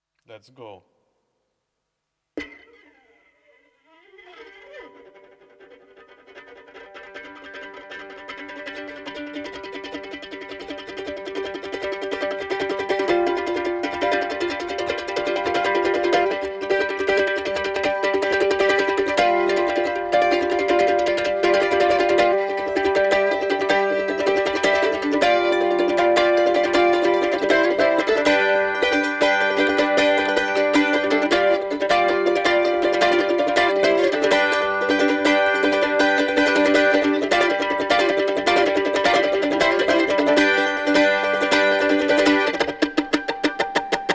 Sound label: kribu-kribu polka